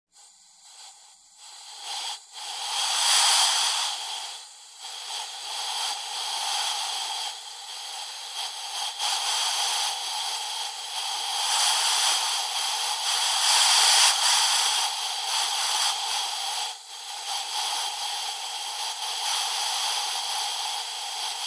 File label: Tire Pressure Gauge checking with hiss